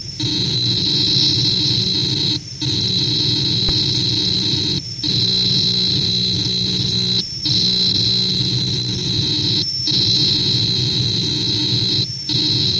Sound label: Atari Tape